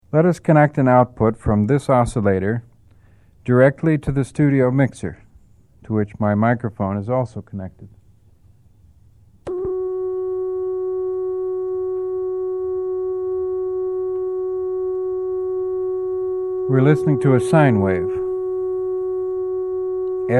Oscillator Waveshapes

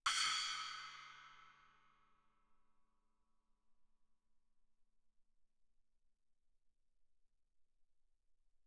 splash stick normal mf, suspended cymbals; Percussion

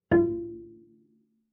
Bass pizz ff sul G Gb4, Double Bass; MIS Pitches - 2014; Strings

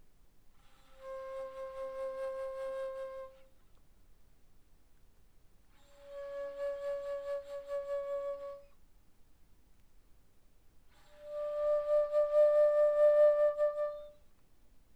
Bass Flute pp C5 E5, woodwinds

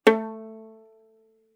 Violin pizz ff sul G A3; MIS Pitches - 2014; Strings

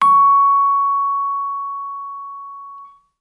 Vibraphone short sustain ff Db6 stereo; MIS Pitches - 2014; Percussion

